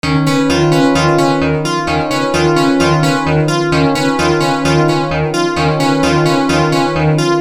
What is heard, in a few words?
173 Beat 4 bar